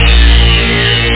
cozbass; Utilities Professional

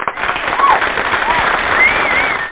Sound Applaud

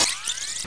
squelch; Star Festival... a Return to Japan

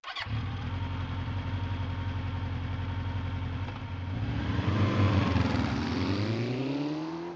BOOT

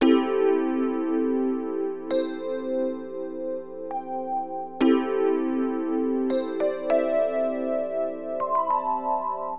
RHODES, AcidJazz Funk Keyboards; LiveAct Pool 1

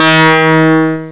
707 hybrid keys

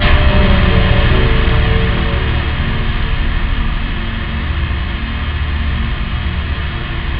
dream, synth

